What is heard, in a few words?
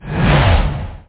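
elevator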